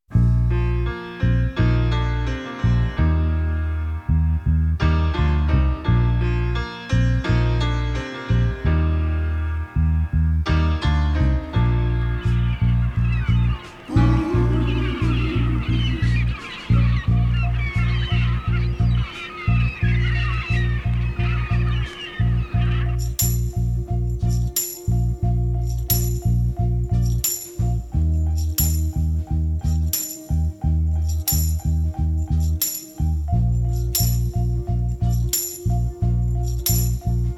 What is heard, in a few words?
instrumental